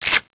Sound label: breaker 12g open, weapons; PD2